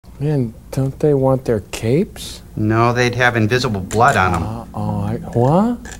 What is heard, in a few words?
huh